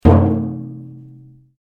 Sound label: RUBBER ON METAL HOLLOW; Metal (Sound Effects:Materials)